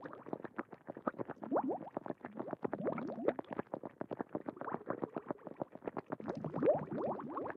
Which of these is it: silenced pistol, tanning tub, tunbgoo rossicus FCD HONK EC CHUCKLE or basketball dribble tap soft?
tanning tub